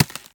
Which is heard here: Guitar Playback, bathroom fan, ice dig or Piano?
ice dig